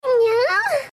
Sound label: nyaah; nyanners